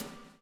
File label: BUBL, BUBBLE; Spectrasonics - Backbeat